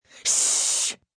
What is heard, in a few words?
Ssh Forceful, Shhhh, Long; (human voice speaking); Whispering; VoiceBox